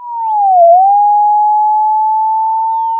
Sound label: Sound of a police car siren